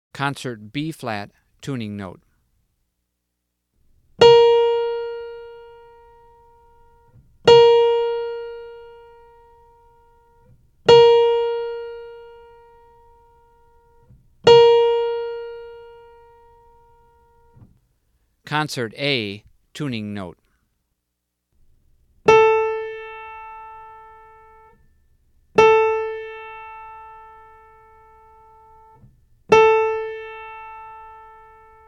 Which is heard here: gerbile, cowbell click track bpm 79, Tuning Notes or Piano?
Tuning Notes